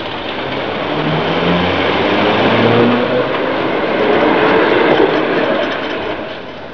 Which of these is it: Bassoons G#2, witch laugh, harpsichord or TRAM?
TRAM